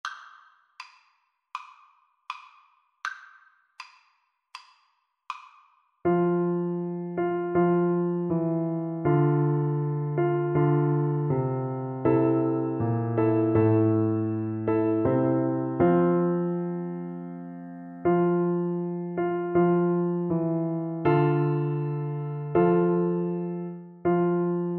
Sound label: steal away, Bassoon